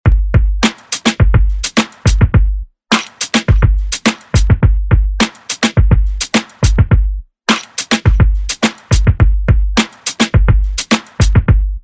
Top40 Redrum

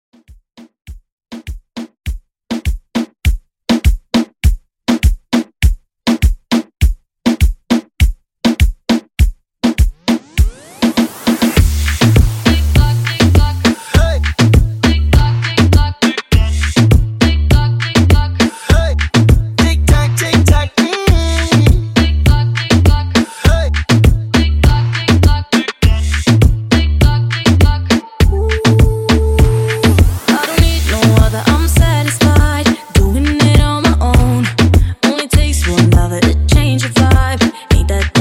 Top40 Mashup Redrum 101 Bpm

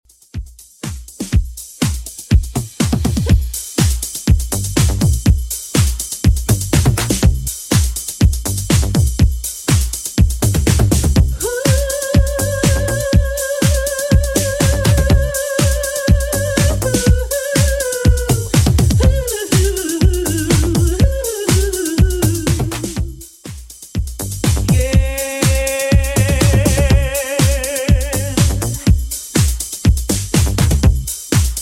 80s 122bpm; Redrum

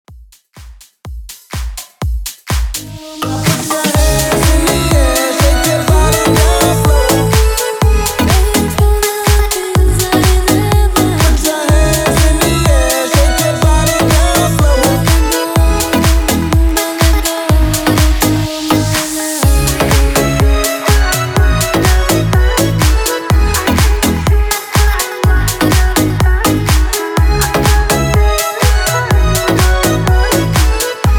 DANCEHALL 124 BPM

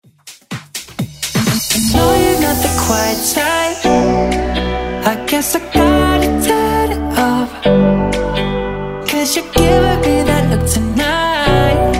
House Intro; Redrum

